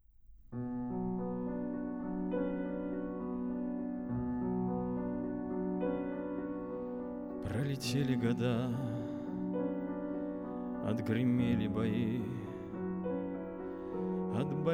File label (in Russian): Хор Валаамского монастыря